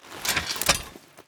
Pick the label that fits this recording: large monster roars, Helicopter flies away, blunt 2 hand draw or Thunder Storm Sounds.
blunt 2 hand draw